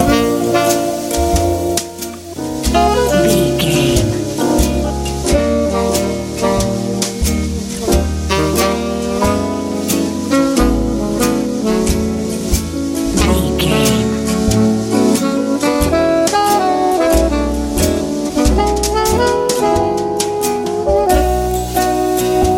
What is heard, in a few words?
colorful good jazz ballad